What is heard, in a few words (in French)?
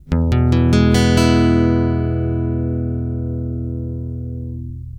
accord mi majeur lent, l’accord de Mi Majeur en vitesse ralentie; guitare